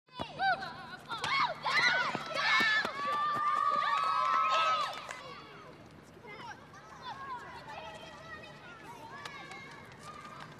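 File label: Playground Background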